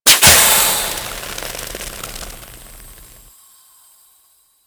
Death Gas Burst; Warframe; Grineer

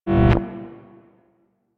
Corrupted Notes T; Warframe; Bard Quest; Bard Boss Fight

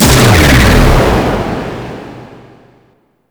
Shot; DE; Warframe; Weapons; Archwing; Powers; Archwing Big Boy

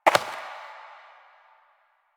Step Dirt; Warframe; Koumei

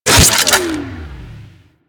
Microwave Pistol Stop; Grineer

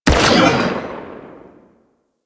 Energy Rifle Split Shot; Warframe; Venus; Venus Grenadier Spaceman Agent

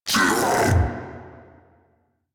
hand bomber pain; Warframe

